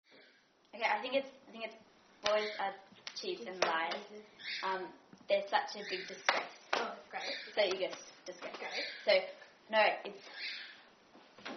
Clapping, Boys Are Cheats And Liars They Are A Big Disgrace